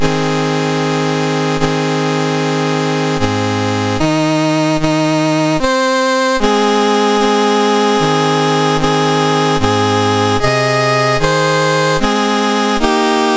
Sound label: MOS6581R2, National Anthems; Malaysia; Dave Marquis